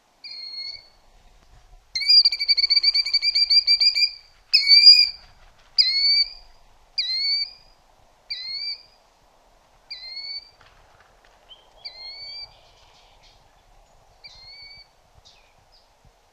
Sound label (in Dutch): Plevier Charadrius vociferus